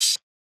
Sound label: ride, cymbals; Picante; RoBKTA Sample Pack Supreme